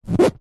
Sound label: household blind fabric finger scratch rub